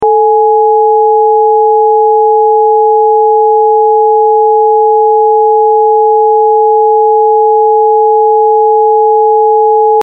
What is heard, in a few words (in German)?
Pyth Septime